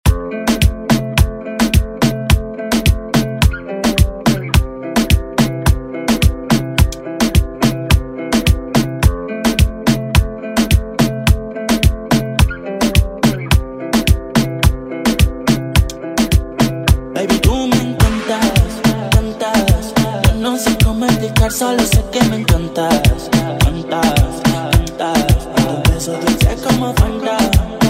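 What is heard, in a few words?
Afro Reggaeton Redrum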